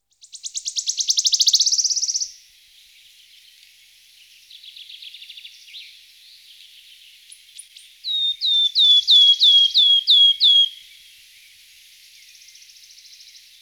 waldlaubsaenger, gesang; singvoegel; roche 2004

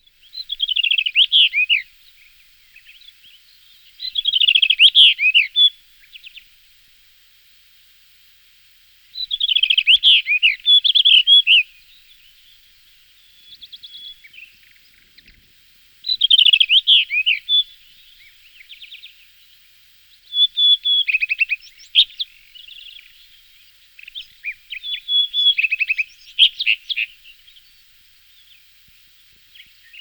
steinroetel, gesang; singvoegel; roche 2004

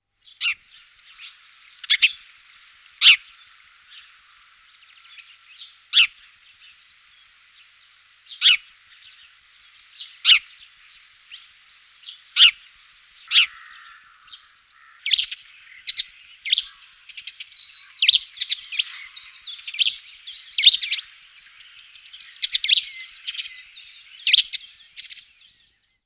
feldsperling, gesang; singvoegel; roche 2004

